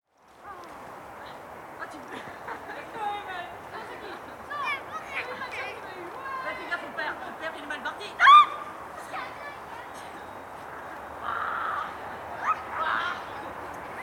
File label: Montreal Parc Sounds December